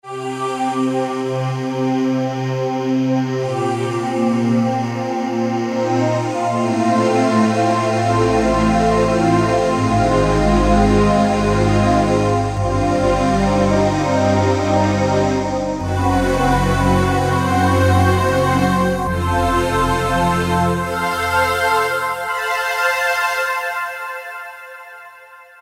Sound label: Ahh, Kawai K1m; Factory patches - I banks